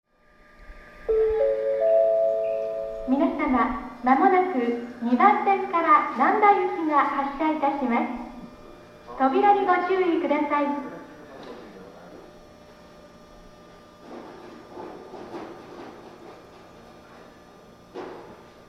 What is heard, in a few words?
rinkandenentoshi bell; nankai